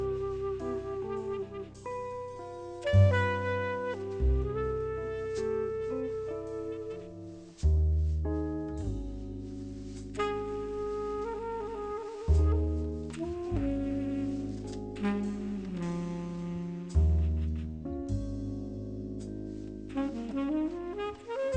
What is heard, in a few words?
jazz